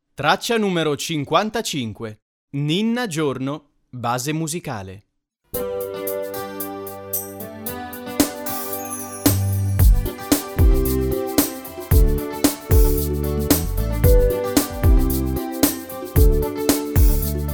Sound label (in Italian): base musicale; infanzia